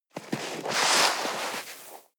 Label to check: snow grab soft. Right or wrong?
Right